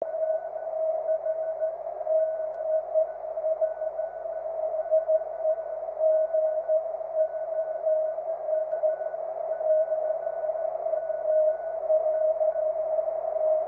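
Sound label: continuous wave, 23cm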